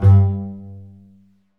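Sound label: strings, contrabasses pizz; String Master Series; Cbs FX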